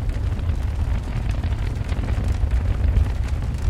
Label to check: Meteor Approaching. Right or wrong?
Right